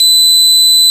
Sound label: Arco Cello C6